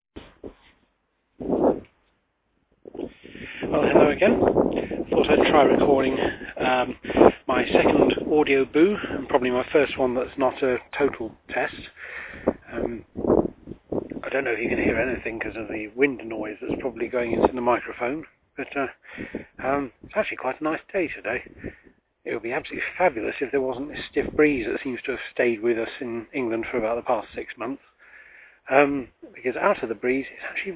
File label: sounds of spring